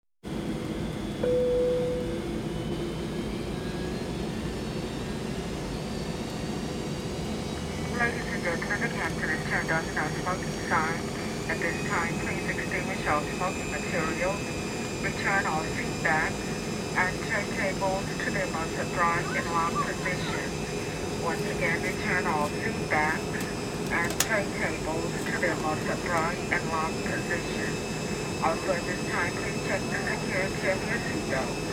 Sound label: Cabin Ambient with seatbelt on notification